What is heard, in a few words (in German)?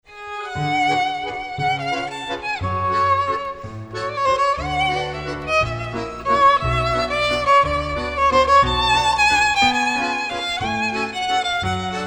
Violine